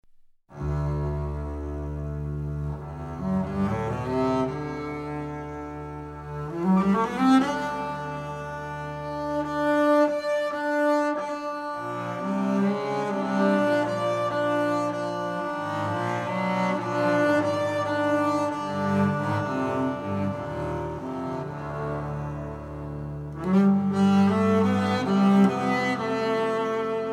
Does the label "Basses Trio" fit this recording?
Yes